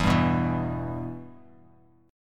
E-Minor; strummed; JGuitar

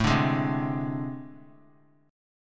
A Minor Major 7th Double Flat 5th, down; strummed; JGuitar

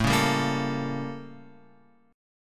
A 7th Flat 9th Guitar Open F; A7b9 strummed; JGuitar

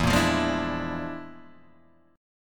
E 7th Flat 5th Flat 9th; JGuitar

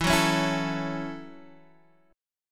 Gb Minor 7th

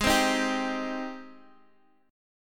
Ab Major 7th, Guitar, Open D; x,11,10,9,10,x; down

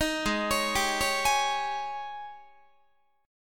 G sharp Major 7th Suspended 4th, Guitar; arpeggiated; 18,16,16,17,17,19; 4A,4E,5A,5D,5G#,6C#; G#M7sus4

